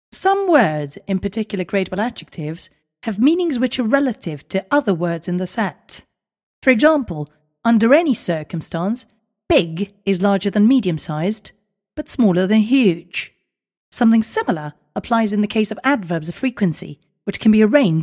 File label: scales